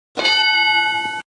Correct Answer Sound Effect, Game Show Sound Effects; Family Feud